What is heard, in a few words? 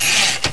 Machine